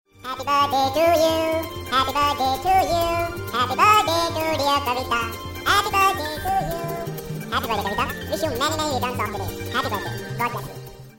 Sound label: Birthday Wishes